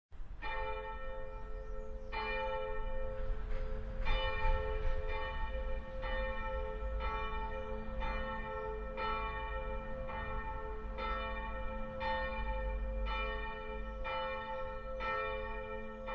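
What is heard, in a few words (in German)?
Tonaufnahme cis1 e1 fis1 gis1 a1, mehrstimmige Geläute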